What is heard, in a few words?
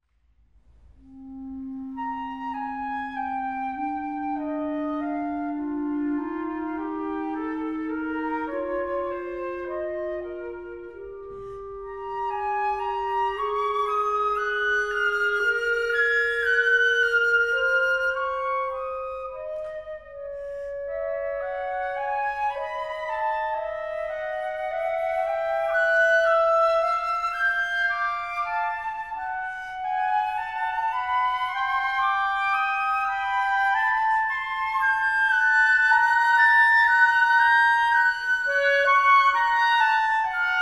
Duos for Flute and Clarinet, Andante sostenuto